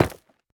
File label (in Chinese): 下界矿石破坏声